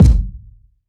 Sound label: tight kick drum single hit g key, bass drum tuned to the G note